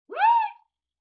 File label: Wind Whistle Zip; Vintage Cartoon; Musical Elements